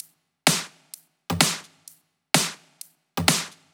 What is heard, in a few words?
Drumloops 128BPM